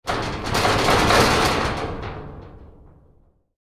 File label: metal shaking